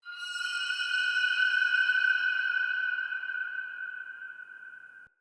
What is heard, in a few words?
Day Texture, Ambience